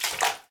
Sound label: sewer step; Golden Crayon Sounds